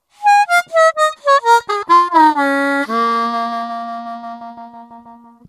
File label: Bb Blues Scale, harmonica